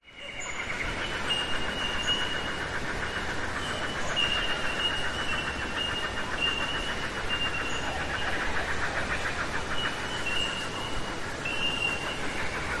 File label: nature forest ambience eastern australia summer bell minors insects wind trees; zapsplat